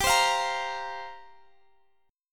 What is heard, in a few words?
F Suspended 4th G; Fsus4/G; strummed